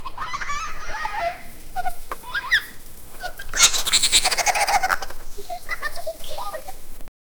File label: splashing water playful g, children's sounds